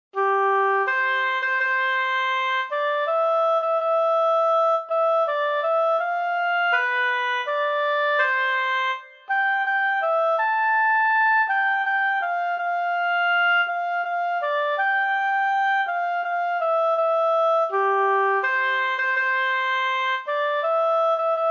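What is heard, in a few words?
solo oboe; O Tannenbaum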